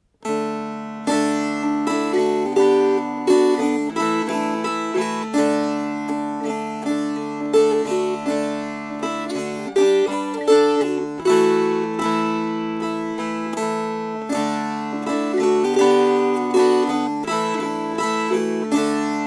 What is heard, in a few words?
Strummed